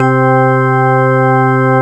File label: organ, funky organs; Zero-G; Funky Elements